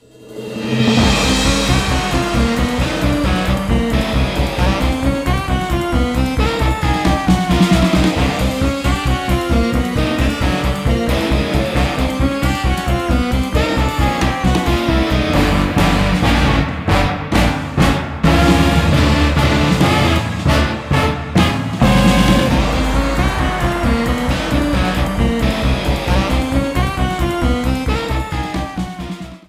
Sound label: Bowser Powers Up